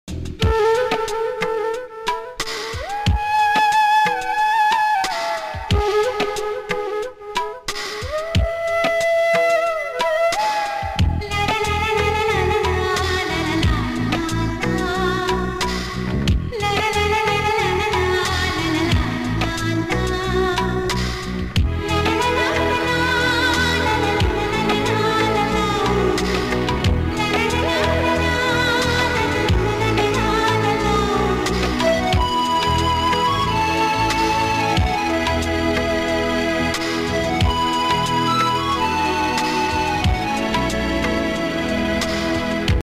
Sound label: song ringtone bgm background music; Roja movie